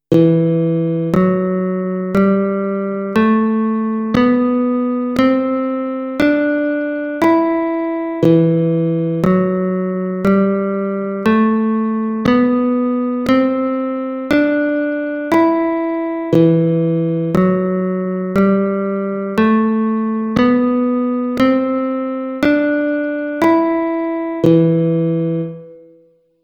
E Tonleitern natuerliches Moll aeolisch

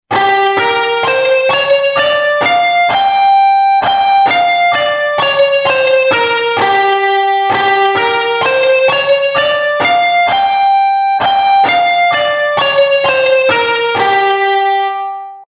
G Blues Tonleiter

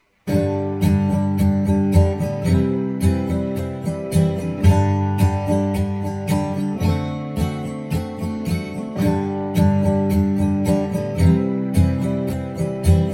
Gitarrengriffe G C D7

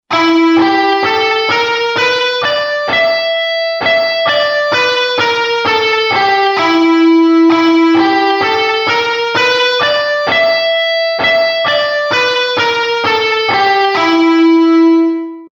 E Blues Tonleiter

